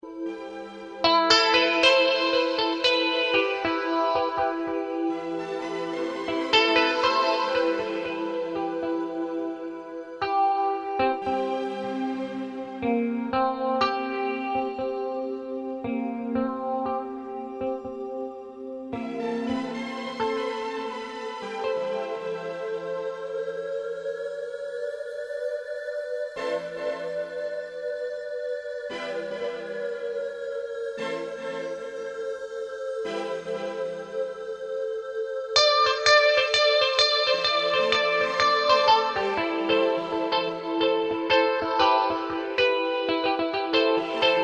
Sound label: tranquill jam